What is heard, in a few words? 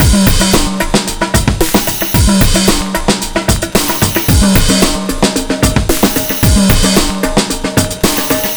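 drum, 112bpm, Breakbeat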